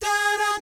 "DAH DAT", vocal jazz fx

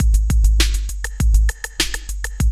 LOOP; E-Lab; X Static Goldmine 2; akai

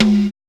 TOM; E-Lab; X Static Goldmine 1

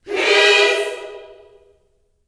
PEACE chord, Gospel; Spectrasonics; Vocal Planet Vol.1 Gospel; akai

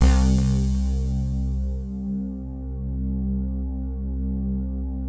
pad; Best Service; XX Large; Pads

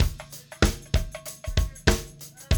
BREAK

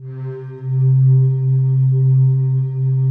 pad, atmo pads; Best Service; XX Large

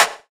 CLAP; E-Lab; X Static Goldmine 2

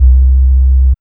BASS

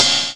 RIDE; E-Lab; X Static Goldmine 2; akai

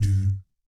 DOOT G2, Jazz; Spectrasonics; Vocal Planet Vol.3 Jazz Fx

